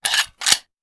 Hand Gun Foley; Sound Ideas; Guns

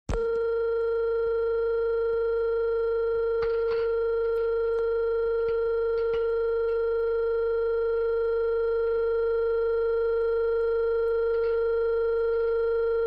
Telephone, European Dial Tone, Foreign, Office; Sound Ideas; Series 2000

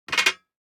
DICE on Metal, Drop, Standard, Six Dice; Samples; ArticulatedSounds; DICE Sfx Party (Extended)

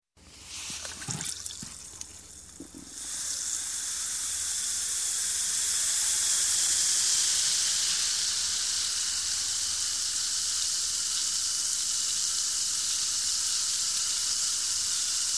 Antacid Powder Pour Into Water And Fizz; Sound Ideas 2000 Series

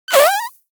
Fart Foley Strain, Thin And Windy; Hollywood Edge; sound designer's toolkit v2

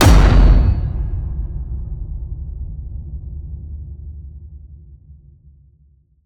HIT Massive, Cinematic Hits Designed

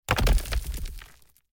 Door; Blind Quest - The Frost Demon

